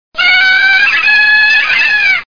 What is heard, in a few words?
tarzan little